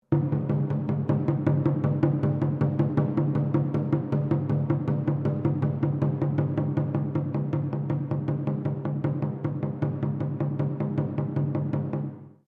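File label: Drum Taiko forte Sequence, Japanese Taiko Drum; Miscellaneous Musical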